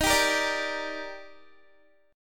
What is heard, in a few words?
Eb Major 7th, Guitar; EbM7; strummed